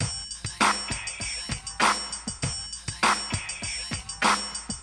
Funk

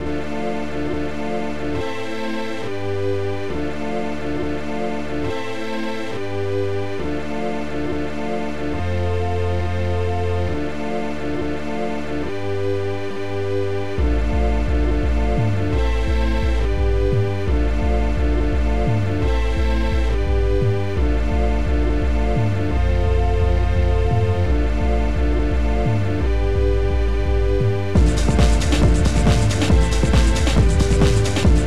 chord; OctaMED SoundStudio V1; mug-members

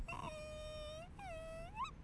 whine; FRACTIONS PIZZA

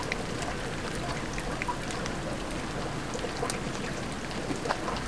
rain out; Kingpin

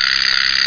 Spinner

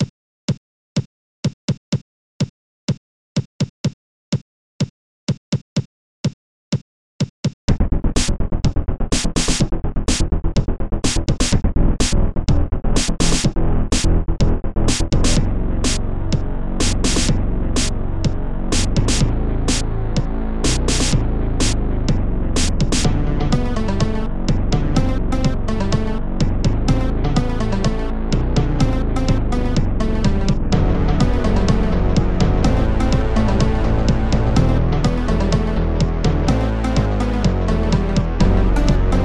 Noise Dream

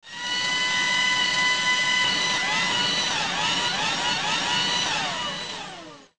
TOY TRUCK; The Interactive Alphabet

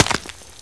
Crawler Ouch; Ferazel's Wand Demo

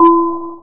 2 BELL; popeye wrestle crazy

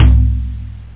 toms; perc; OctaMED SoundStudio V1; jazzies samples

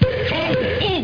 KNOCK

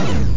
laser fire; LiFun

